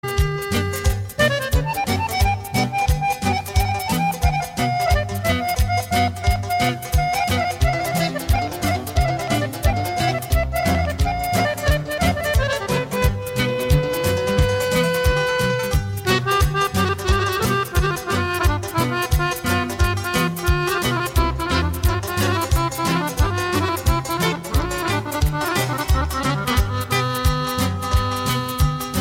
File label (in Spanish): acordeon